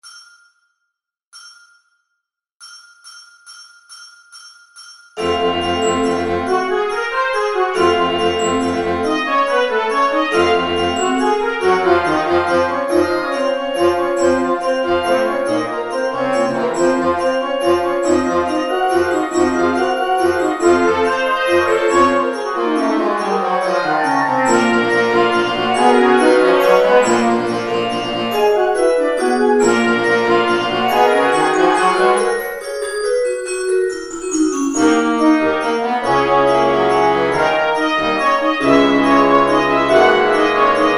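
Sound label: click with mallets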